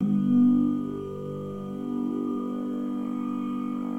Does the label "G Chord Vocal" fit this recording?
Yes